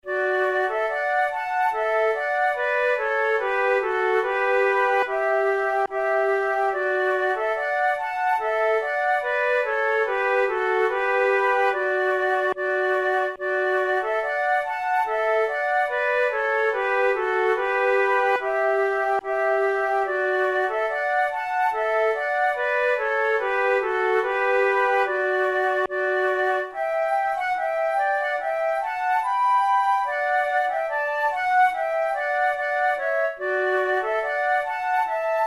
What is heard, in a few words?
duet, flute